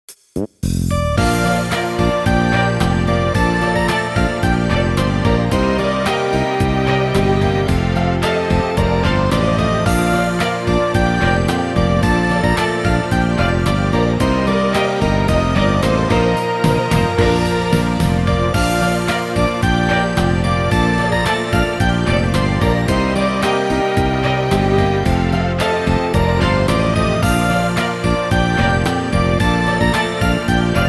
Success Winter BGM

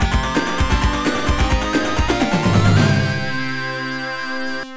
VS Mode Victory; golden axe iii; genesis

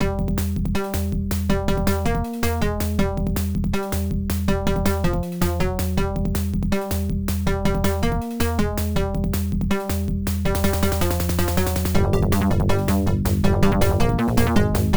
Stage 4 Boss BGM; Burning Dragon; PC-9801; OPN

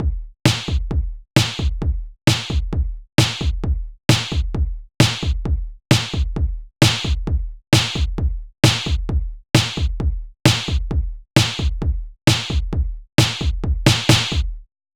Boom Chuck

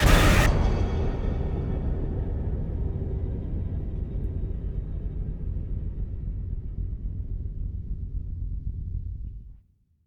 screamer; Joe's Diner

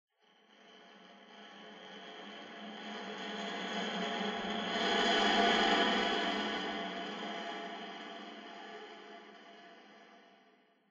Combat Crack; sinking city the

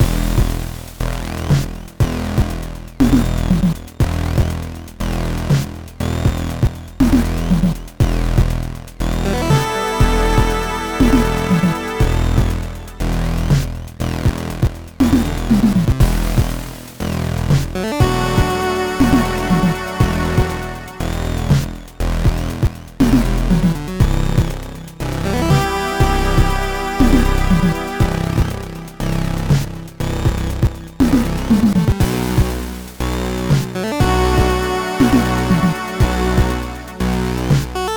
House Loop; high strangeness; wii u

